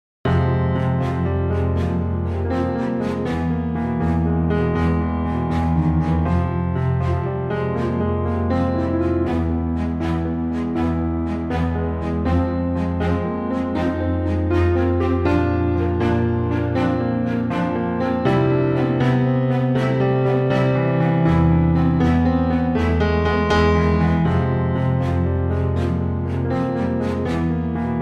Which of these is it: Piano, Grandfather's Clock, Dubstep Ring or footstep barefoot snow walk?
Piano